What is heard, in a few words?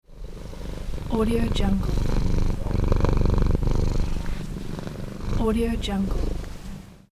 cat purring